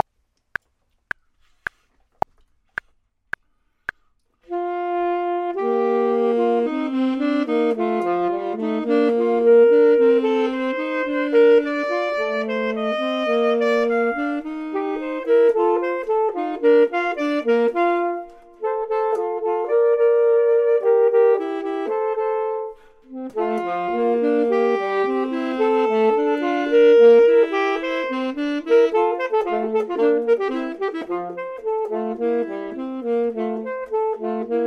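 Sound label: both, Saxophone and Clarinet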